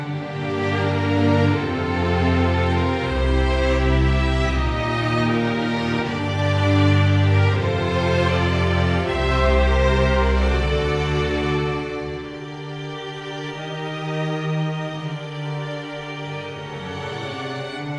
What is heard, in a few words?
Strings